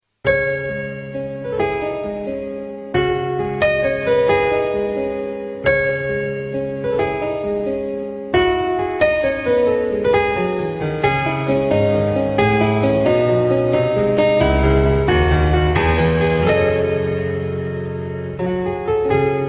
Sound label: Beautiful Piano